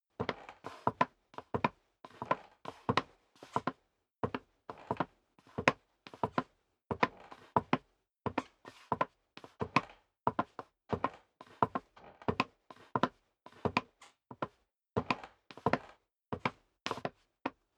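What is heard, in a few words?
step sneaker wood creak walk sequence, footsteps; schritte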